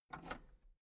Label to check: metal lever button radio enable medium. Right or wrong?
Right